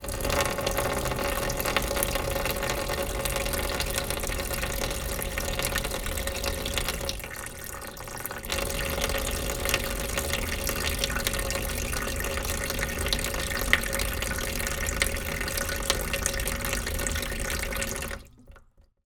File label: household water tap drip trickle in plastic bowl